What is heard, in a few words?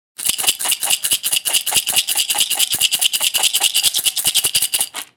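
toothpicks sound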